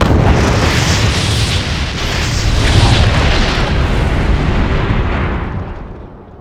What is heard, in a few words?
explode, ambient explosions